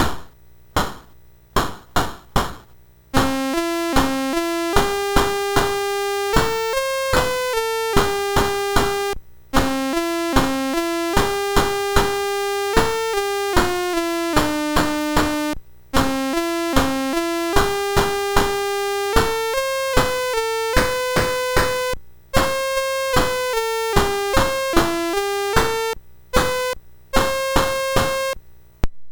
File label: MOS 6581